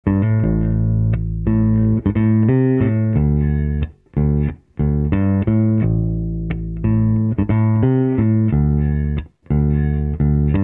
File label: jazz bass, 15" driver; SVT7PRO; Emi 3015; Jazzbass riffing; jazz bass riffing through the Emi 3015